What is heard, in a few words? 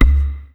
DEEP EKO WD R, DRM HITS; Spectrasonics; Liquid Grooves; WAVDRM HITS1